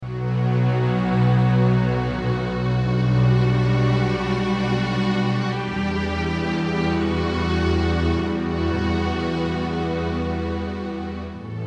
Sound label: 85 bpm D, Free Music